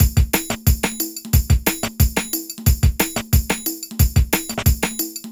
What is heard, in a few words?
Latin Beat, Drum & Bass, Beats & Breaks; Fluid Dynamics